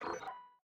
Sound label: Scan; Lethal Company